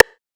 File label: Perc Rim; Percs